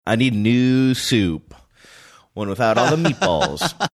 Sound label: singing i need a new soup